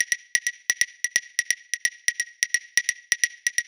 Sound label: 130 Perc Hyper Claves; Zero-G; Future Wave